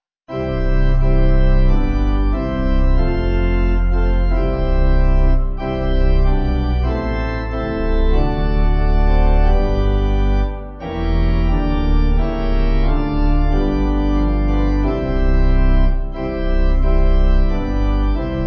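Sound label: pipe organ hymn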